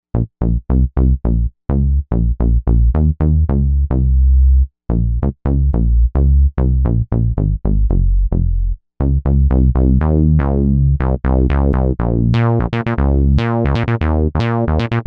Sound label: Rubba Bass, Novation UltraNova; Factory Patches